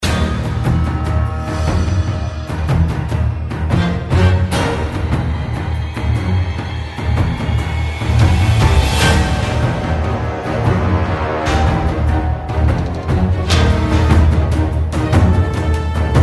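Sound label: background music boss fight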